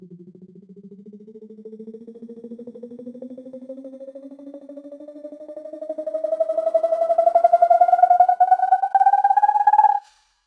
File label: short high pit, attention grabbing